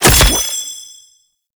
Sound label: Resource Pickup; Shadowgrapher